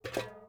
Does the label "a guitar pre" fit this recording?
No